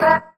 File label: E VOX STAB; funky; Loopmasters; Funky Underground Vol 2